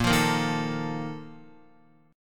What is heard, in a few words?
Bb Major 7th Suspended 4th, Guitar